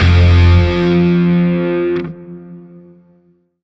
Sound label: Shawzin Phrygian Chord F; Music; Warframe; Grineer; Chords